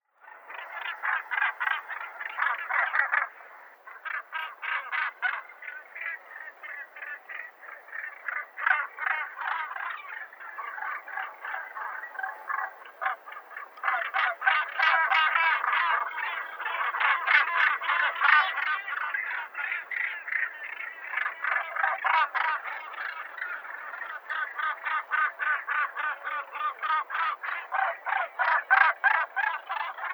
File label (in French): fou bassan